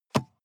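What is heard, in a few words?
sports car interior compartment close soft; verkehr transport; autos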